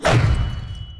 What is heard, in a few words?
Dark Spear